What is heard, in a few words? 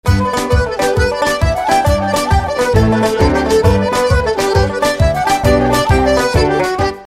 jig; II figura; MAYO LANCERS SET